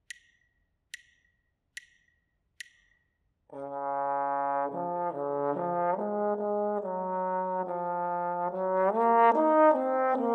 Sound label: melodic error detection; ear training